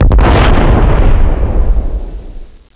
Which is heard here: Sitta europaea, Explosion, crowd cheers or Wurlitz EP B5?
Explosion